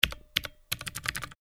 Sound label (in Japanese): タイピング メカニカル 短